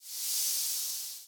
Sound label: snake hiss; smite; medusa